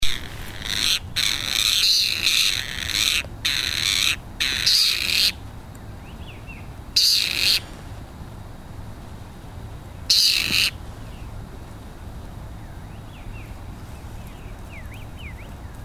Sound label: partridge tibetan Perdix hodgsoniae